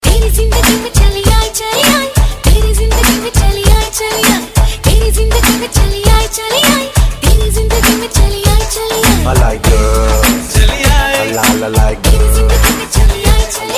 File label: Indian Ringtone